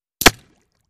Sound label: Bullet Impacts Water